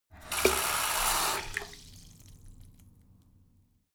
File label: sink fill splatter medium short; haus-garten; badezimmer